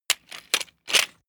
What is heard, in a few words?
metal handling mechanical click slow